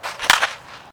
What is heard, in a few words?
Baseball Bat Crack